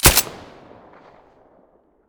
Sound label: m9a3 silencer stereo; beretta